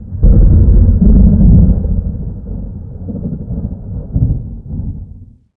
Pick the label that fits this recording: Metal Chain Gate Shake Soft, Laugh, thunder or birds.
thunder